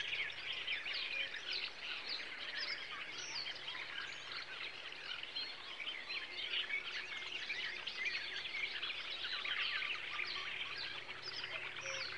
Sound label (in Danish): Sommer fugle bier; VEJR